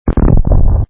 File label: Bomb